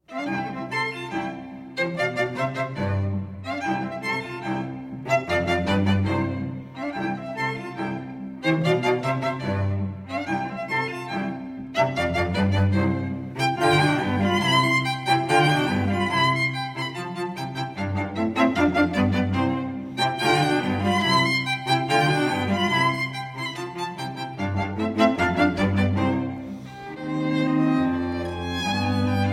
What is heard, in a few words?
Divertimento, Allegretto (Arr. for Violin, Viola and Cello; K. Anh. , No. 4 V. Allegretto